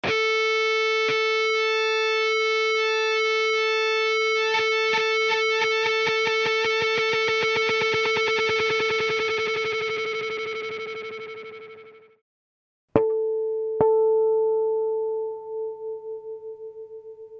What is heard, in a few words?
guitar tuning sample